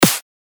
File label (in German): Snare Referenz